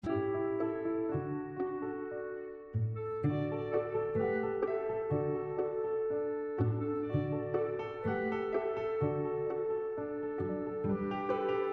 musette; toctoctoc; telequebec; ateliers

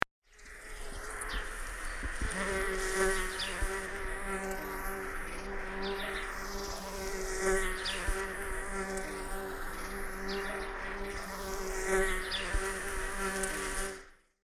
abeille

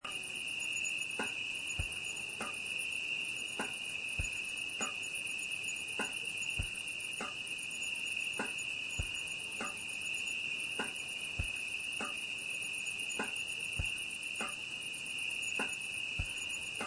grillon

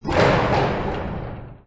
porte; rollercoaster

